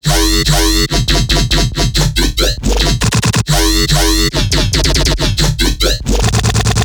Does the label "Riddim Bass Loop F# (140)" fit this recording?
Yes